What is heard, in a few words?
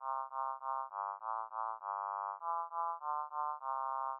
pitch